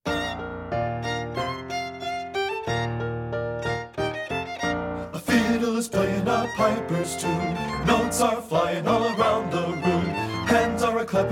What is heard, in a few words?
THE GLASGOW HOOT BASS, men; partbypart